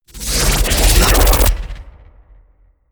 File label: whoosh flutter